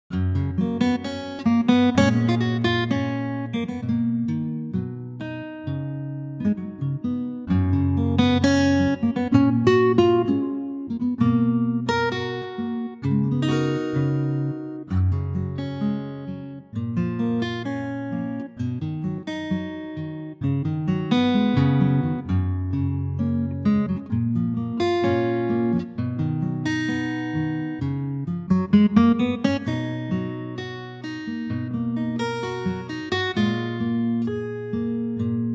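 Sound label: Gb Ac Gtr, male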